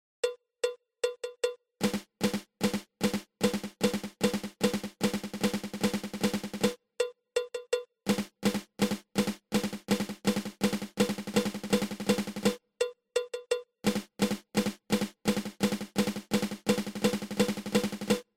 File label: Flammed Mill